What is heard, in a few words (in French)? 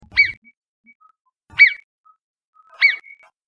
marmotte, Musique